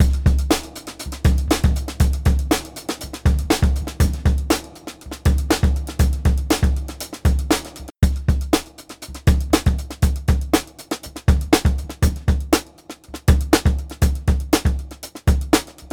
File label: drum gate